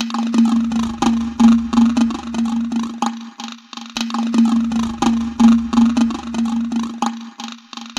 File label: Small Chorus Drum